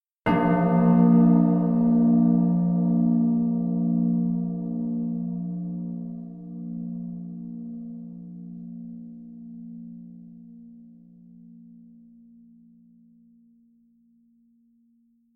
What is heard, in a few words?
Meditation Bell